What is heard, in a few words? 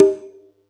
Yamaha EX5, Drums